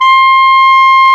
clarinet, Clarinets

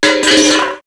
Metal Crash, perc fx; X-Static Goldmine

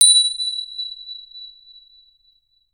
SPINNIN, BELLS CHIMES; Voice Crystal - Steve Reid Definitive Percussion

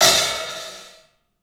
HAT, CLUBHAT, 3D Drums; 3D Sound

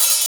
OP HAT; East West

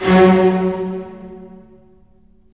STR, STR Full Short; Roland - LA Composer vol. 4

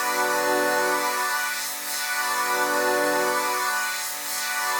Pad Loop D, shimmer and sparkle

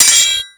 SW HIT, MORESWORD; Total Control - Action Cutz

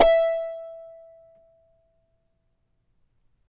CHARANGO E 4; Spectrasonics; Hans Zimmer Guitars VOL-2

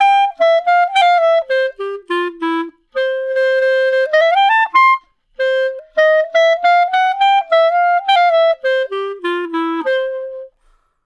Clarinet One Shot, melody

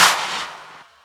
Claps; Vengeance; Essential Clubsounds Vol.4

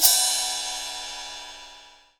SCRAPE, Crash Cymbal

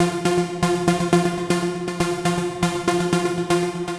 Trance Synth

